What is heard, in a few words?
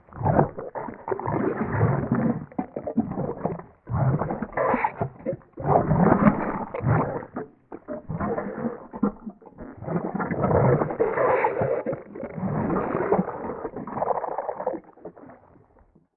Movement Large Wet; mvmt